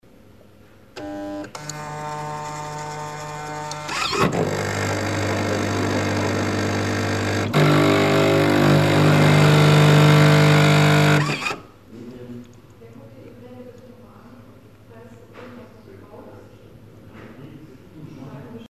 kaffeemaschine heizt

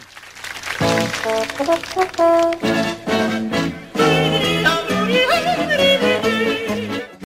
einen jodler

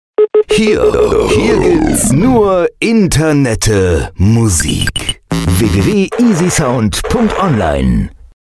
jingle; easysound

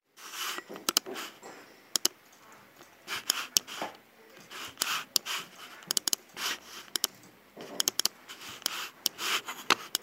ep mouse

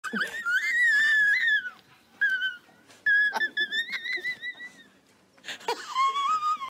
lachflash

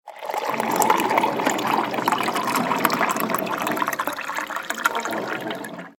ep wasserspender

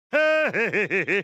plop lach

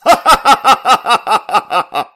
lachen

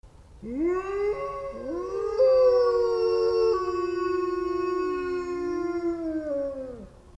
wolf schrei

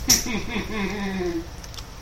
lachen; gottschall

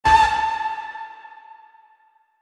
hupe

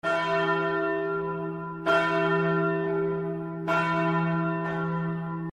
glocke

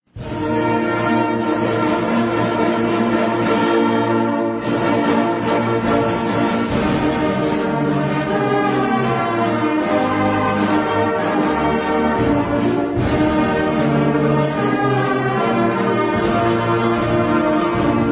blasmusik feierlich

